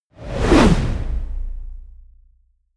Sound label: whoosh